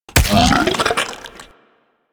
Tendrils Digest; Warframe; Warframes

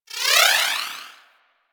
Pet Skip Rope Spawn; Warframe; Moa; Tenacious

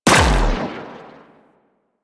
Briefcase Akimbo Pistol Alt Shot; Warframe; Weapons; Corpus

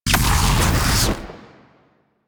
Flame Thrower Shot; Drill Sgt

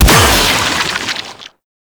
Shot; Warframe; Weapons; Infested; Inf Sniper Rifle; Coda Sporothrix

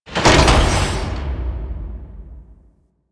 Big Door Close; Warframe; The New War; Narmer Fortuna; Venus

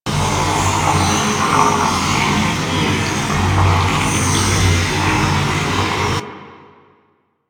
Hub Shield Loop; Warframe; Tenno

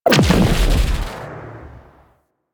Rocket Explosion; Elite Jelly Rocket; Warframe; Railjack; Grineer; Space Fighter Dredger Agent

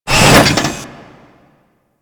Frame Jump; DE; Warframe; Vay Hek

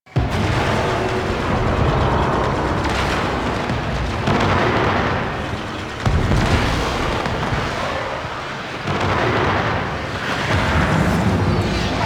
Interior Amb; Warframe; The New War; Red Nebula

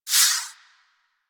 Fighter Fire Whiz By; Warframe; DE; Vehicles; RailJack; RailJackEnemyShips; Grineer; Space Fighter Rager Agent

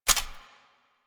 Bow Latch

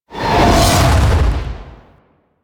Golem Melee Swing; Warframe; Attacks

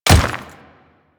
Dirt Step, Footsteps; Warframe; Grineer Royal Guard

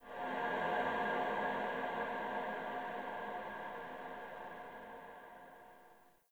Hub Texture H, Ambience, Dungeons, Under City; Silent Rose

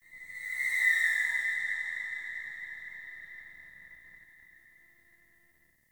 Day Screechy Creature; Glades Of Andurin

